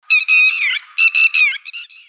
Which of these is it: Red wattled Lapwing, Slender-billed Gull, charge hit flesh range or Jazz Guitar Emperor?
Red wattled Lapwing